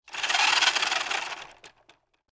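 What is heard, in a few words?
Radio Controlled Electric Car Running, close up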